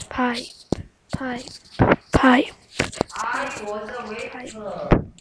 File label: pipe